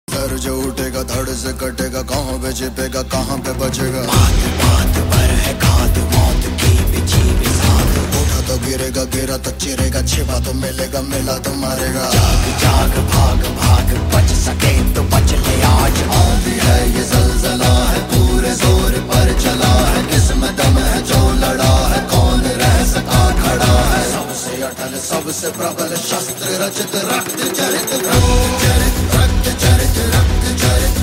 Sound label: ringtone attitude ringtone song ringtone bgm